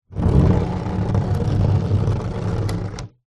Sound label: foley wood large board drag ground